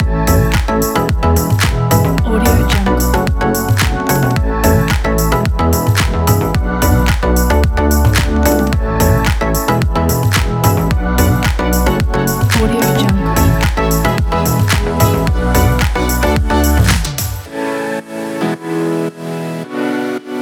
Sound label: tropical house